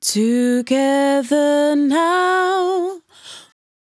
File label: 100bpm Bm, Velvet Vocal; Zero-G